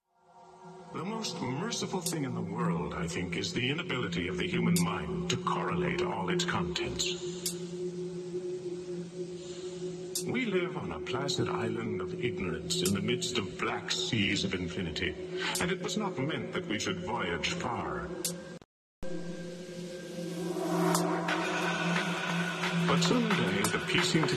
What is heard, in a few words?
Drum Bass; DropZone Recordings